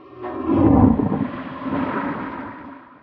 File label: flush, materials; Garry's Mod; hl2